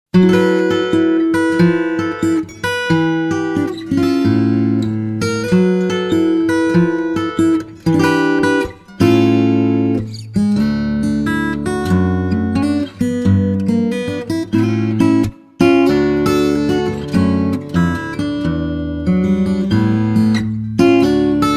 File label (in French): Canicule Blues